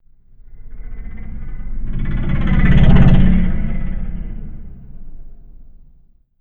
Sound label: SCRAP DRIVE, Anime Sound FX; FLYOBJECTS